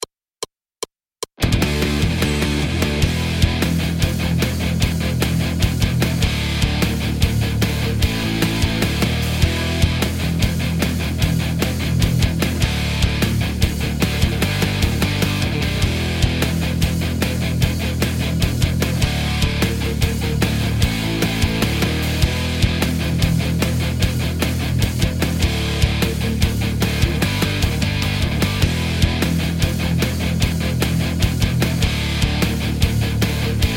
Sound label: 80s Rock Groove